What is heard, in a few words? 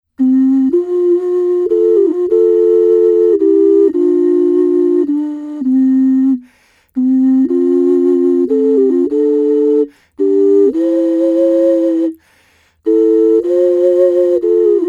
Bass Duet Amazing Grace, ocarina